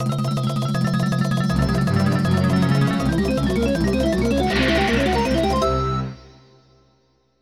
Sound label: Transformation; Nintendo 64; Banjo-Tooie